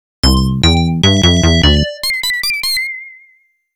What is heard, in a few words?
game over